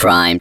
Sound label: Synth Voc; Synth Shots; Vengeance Samples